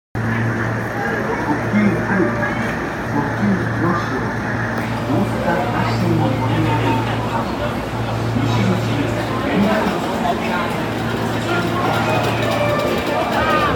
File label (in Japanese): 大阪発車, train sounds